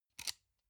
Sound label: sunglasses plastic grab soft